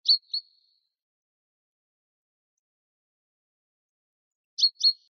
mesange bleue

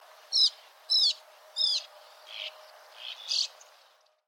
hirondelle de rivage

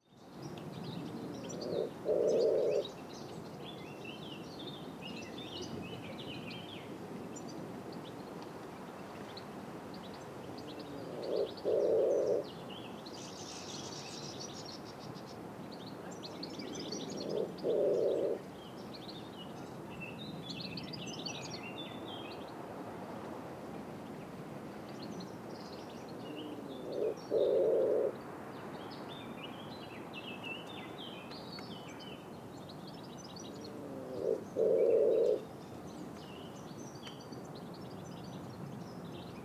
pigeon ramier

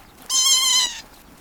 vanneau huppe

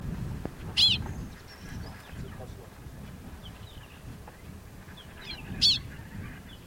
niverolle alpine

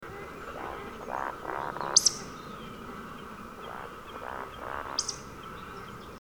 becasse des bois

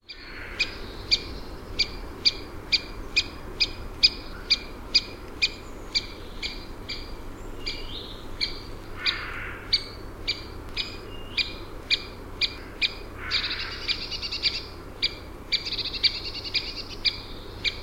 pic epeichette

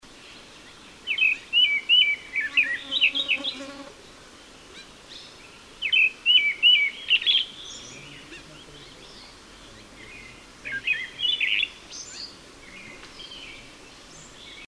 grive draine; Trille de type Itération rapide Glissando Gazouillis LEnt; chant

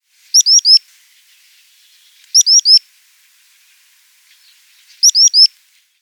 chevalier guignette

